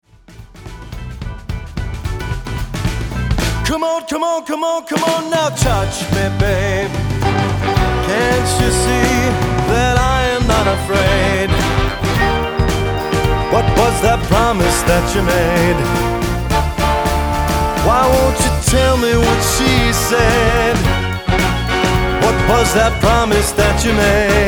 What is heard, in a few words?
big band